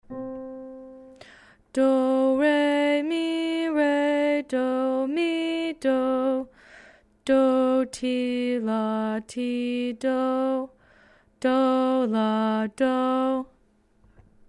Centering Song

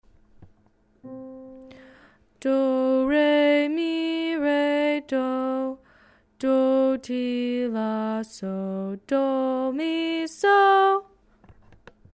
Centering Song

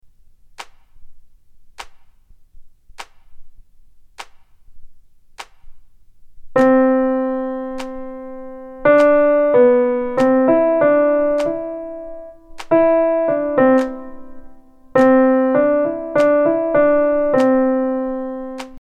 Melodic Dictation

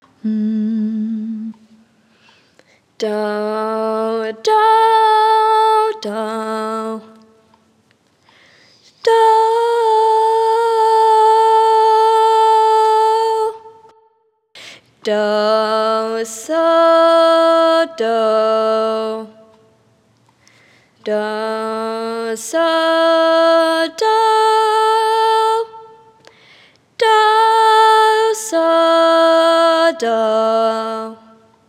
Intonation in A top starting note

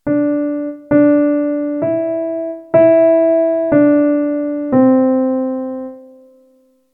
Melodic Dictation Pitches Only

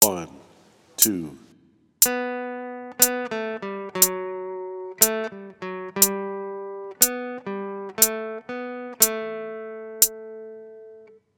Melodic Dictation

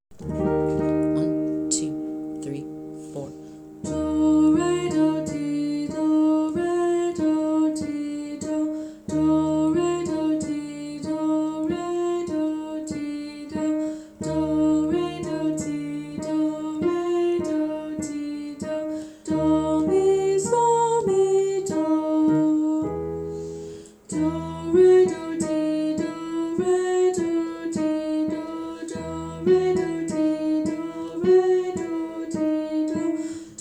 Nee ah Solfege

